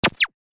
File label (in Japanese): プチュン トランジション